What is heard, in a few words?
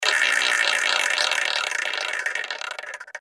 flapper, wheel